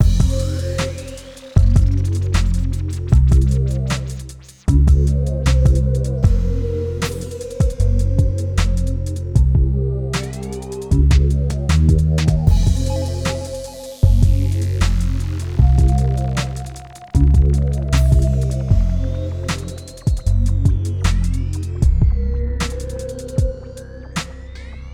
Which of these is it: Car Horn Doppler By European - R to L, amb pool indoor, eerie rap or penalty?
eerie rap